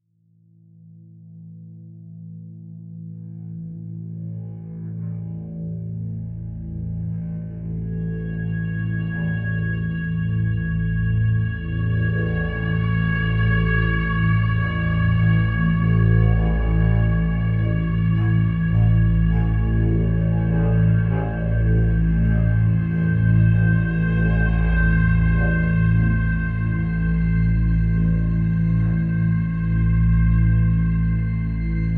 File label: Ominous Atmosphere